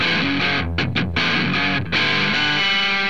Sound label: guitar, heavy distortion guitar